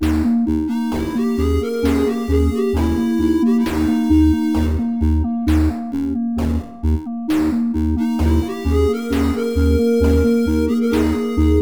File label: Indian music sketch